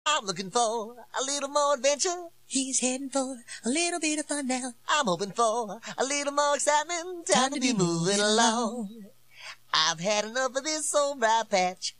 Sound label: How Do Ya Do Rabbit and Female Vocal